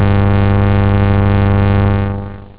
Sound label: analog, synth